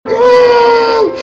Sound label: cry